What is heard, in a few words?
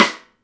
STICK, Drum 1 Dry; East Collexion